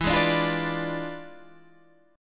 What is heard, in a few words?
E 7th Flat 9th Guitar Standard, chord; E7b9; strummed